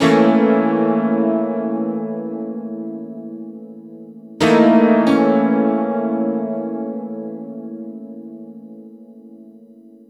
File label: Keys, Keyboards; Sonic Foundry (Sony Creative Software); World Pop